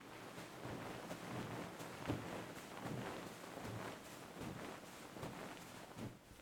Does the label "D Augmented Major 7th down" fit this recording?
No